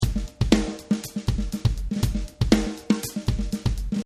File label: Drum Loop; kickbuttowski; mellowbrook